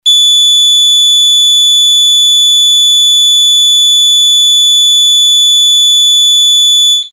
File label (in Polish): ton MIDI